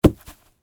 Traffic Cone Drop, Industry, Traffic, Cone, Drop, Grass, Orange, Road, Cone; Traffic And Car Sound Effects; BlastWave